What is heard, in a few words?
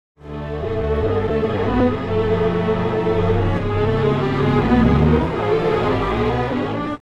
strings, timestretch; ikm sampletank stretch